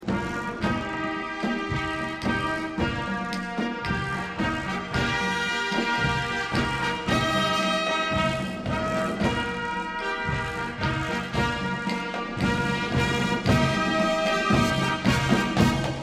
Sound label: Rumba Romantica